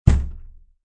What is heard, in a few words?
Door Hit, Hitting a door with hand; Hit Sound Effects; Sound Effects:Objects